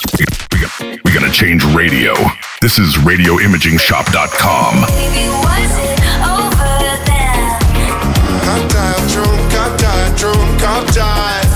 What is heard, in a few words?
Radiojingle CHR Beatmix